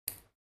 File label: Ping Pong Ball Catch